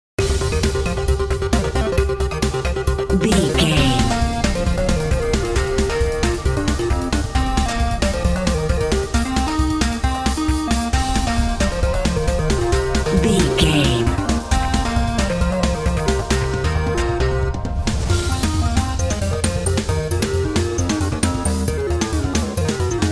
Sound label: epic video game mission